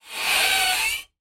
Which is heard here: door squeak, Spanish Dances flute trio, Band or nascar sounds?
door squeak